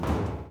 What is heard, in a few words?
step grate soft